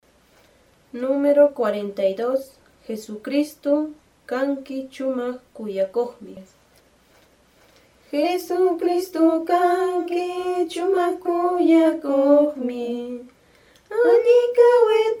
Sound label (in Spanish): cancion